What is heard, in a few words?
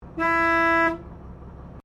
Bus Double Decker Bristol VR Exterior Horn; Bristol Vr 1971; Bellarine Tourist railway vehicles; Busses